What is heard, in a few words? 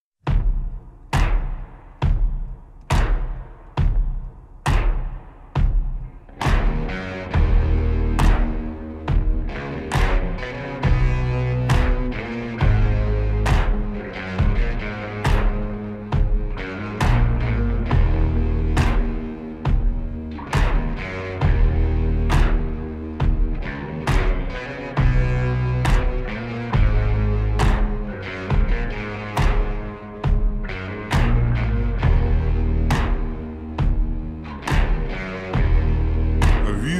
slowed reverb